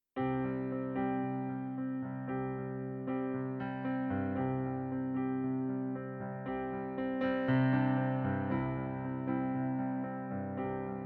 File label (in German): Klavierplayback; Pianoversion